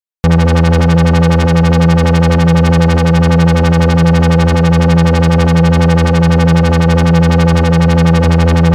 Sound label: Electro Bass Loop